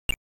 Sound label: menu option